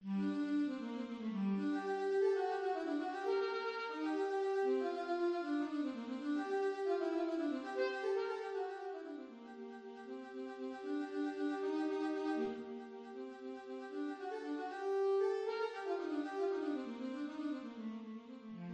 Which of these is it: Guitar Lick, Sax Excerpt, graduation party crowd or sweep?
Sax Excerpt